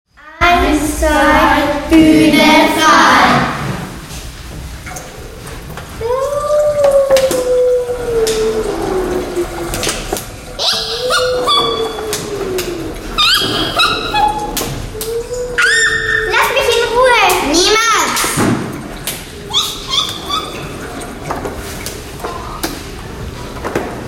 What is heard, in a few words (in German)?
Gruselgeräusche